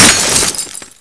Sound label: glass